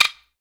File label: Sticks; SB Sticks